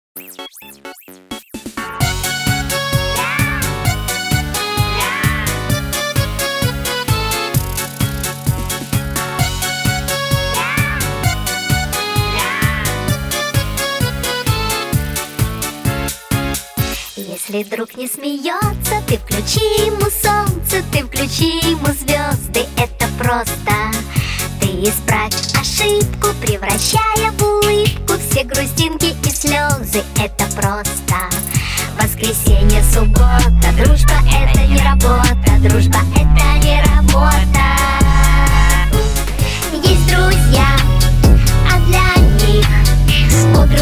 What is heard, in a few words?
trap remix